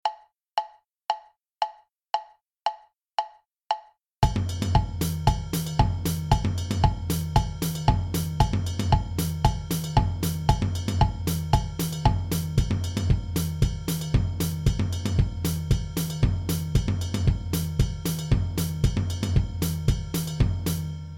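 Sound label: sikyi, drums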